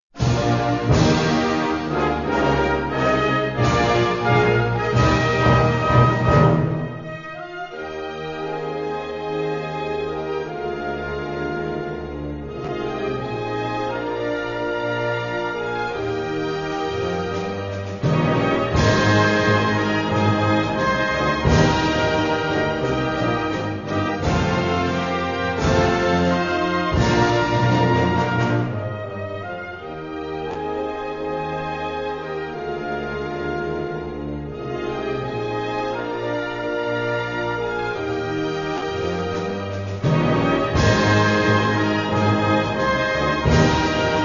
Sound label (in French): seychelles, hymnes